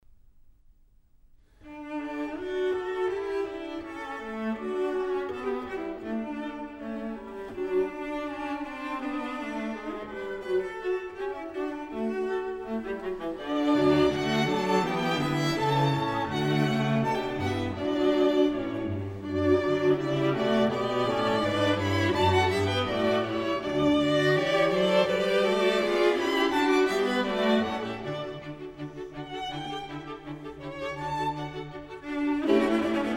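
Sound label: String Quartet No 21 in D Allegretto; Wolfgang Amadeus Mozart; Mozart String Quartets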